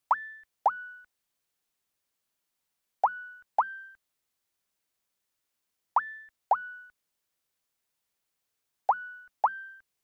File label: 164 BPM B Min Accent; Loop Stems; Cymatics; Rose